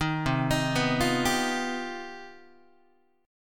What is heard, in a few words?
C Minor Major 11th C down Guitar 4A 4E 5A 5D 5G sharp 6C sharp; CmM11 arpeggiated; JGuitar